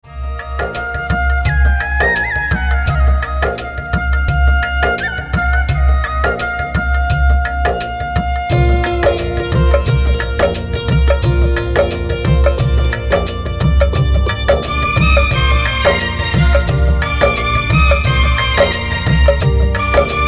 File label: Instrumental